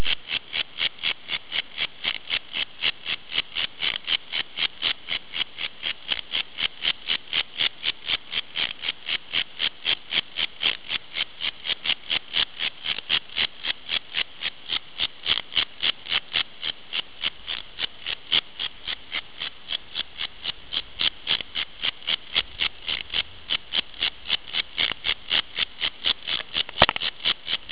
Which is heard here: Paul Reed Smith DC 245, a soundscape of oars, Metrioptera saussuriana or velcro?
Metrioptera saussuriana